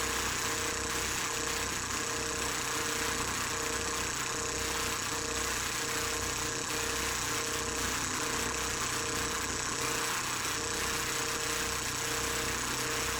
Hum Loop, Sci-Fi Sounds

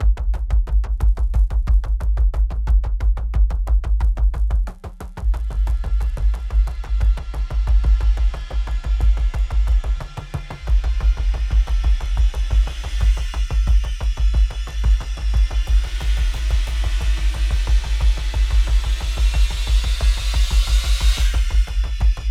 Action, Loop, 180BPM; Dead Ark; Classic Horror Sci-Fi Music Pack; Action 3; Alter B

